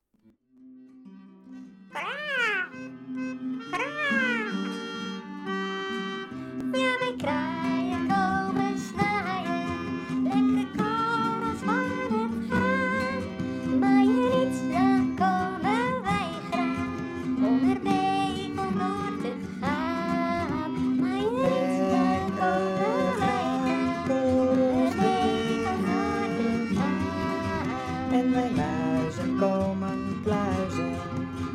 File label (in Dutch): kraaien gezongen; musical de goede bakker